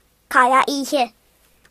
owl; gcompris-data

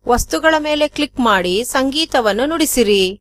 melody; gcompris-data

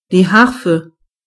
harp; gcompris

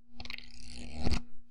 card shuffle; lskat; games

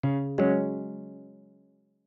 App Error; oxygen sounds; plasma

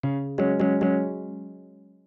App Error Serious Very; Oxygen; plasma

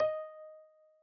Im Message Out; oxygen sounds; plasma

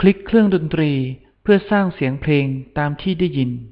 melody; gcompris-data

